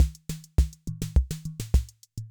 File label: samba, Yamaha MR-10